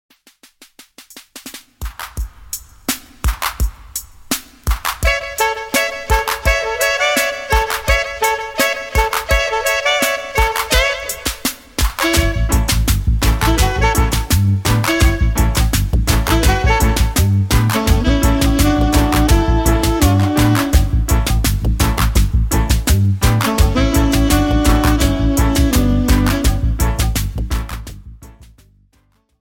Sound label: Big Bad Sax